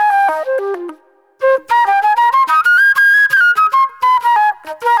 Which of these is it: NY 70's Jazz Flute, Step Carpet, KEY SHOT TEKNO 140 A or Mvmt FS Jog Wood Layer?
NY 70's Jazz Flute